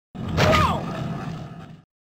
crushed by stone; crash bandicoot

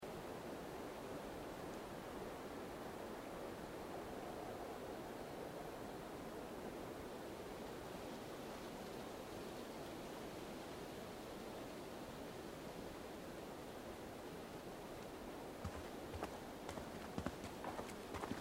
walking road forest hintergrund; Life is Strange 2; gamesoundopedia

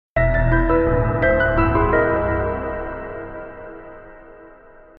secret room find

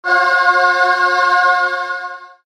choir unlock

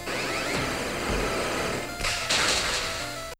aurora beam; pokemon x y

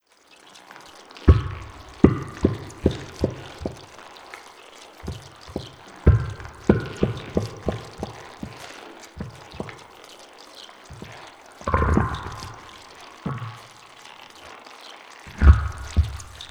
tentacle plants; battlefront 2 2017

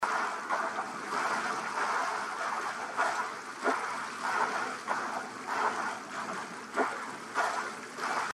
swimming; Titan Souls

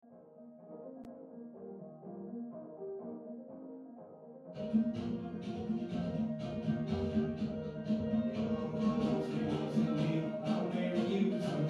entering church sound quelle music; Life is Strange 2; gamesoundopedia

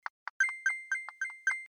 coins; Subway Surfers

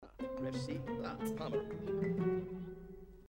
mood embarrassed; sims 4

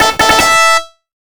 music challenge yay; duck game

